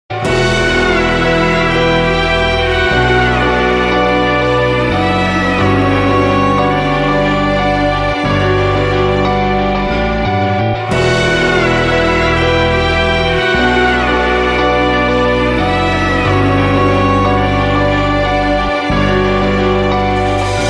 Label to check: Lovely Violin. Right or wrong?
Right